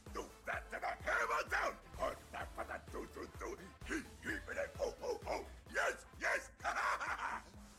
hammer down; Reinhardt; overwatch

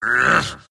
wraith grunts; Dota 2; skeleton king

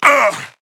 Grunt Hit; Paladins; Makoa

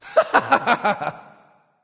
laugh; K9 Anubis; Smite

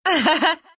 laugh, ru; Dota 2

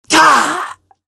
pain; Dota 2; chen

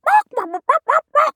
Chicken; Mage; Kunoichi; Realm Royale

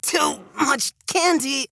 Death; Demon

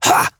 Grunt Attack; paladins; Koga

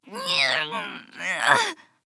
grunt lift; Skye

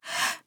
Breath; Paladins; Skye

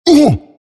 bristle pain; Dota 2; bristleback

